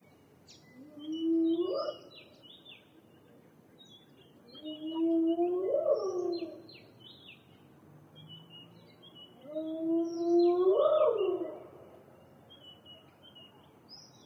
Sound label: Spotted Hyena